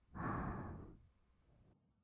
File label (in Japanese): は, 吐く音, ブレス; ASMR; 1200 kawaii voice!!